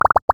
secretbox boxshow; pinball; Gacha

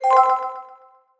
lucky wheel stop; pinball; Gacha

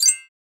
collect Booster; pinball; Chocolate

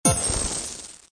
Goal; pinball

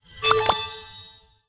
flip Card show; pinball; Gacha

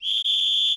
luckywheel ready; pinball; Gacha

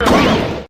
poof; ARTracking

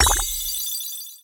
multiball; pinball

